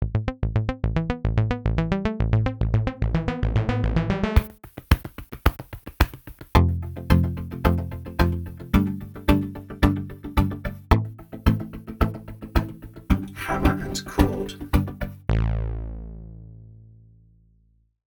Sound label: Hammer And Chord detune